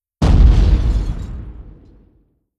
airplane bomb; Hearts of Iron IV Death or Dishonor

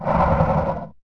Ogre; Sid Meier's Civilization 4 Complete; Beyond the Sword; FfH Age of Ice; units

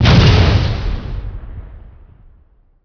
explosion medium; Emperor - Battle for Dune

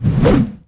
axe pass by; Mount&Blade Warband

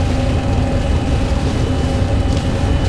Dread Forest Run Lp; Sid Meier's Civilization 4 Complete; Beyond the Sword; Units

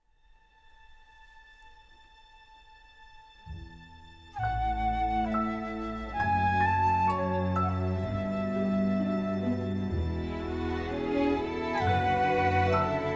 Music; Age of Empires III

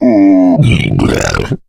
male boomer lurk; Left 4 Dead 2

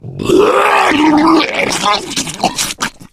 male boomer vomit; voice; Left 4 Dead 2

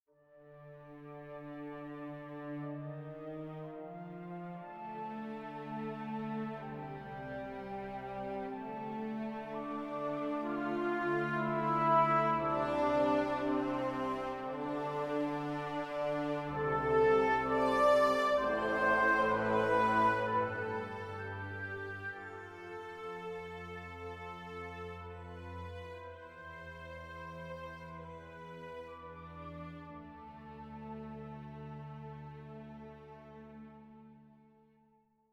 travel north; Mount&Blade Warband

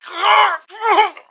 normal dying; Emperor - Battle for Dune

